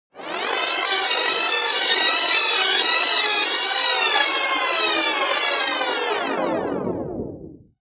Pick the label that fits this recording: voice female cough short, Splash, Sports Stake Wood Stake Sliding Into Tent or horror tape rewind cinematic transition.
horror tape rewind cinematic transition